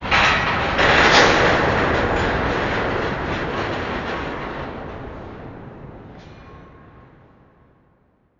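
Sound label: industrial ambience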